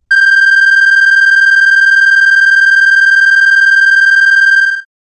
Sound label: Alarm Synthetic; hi-tech-various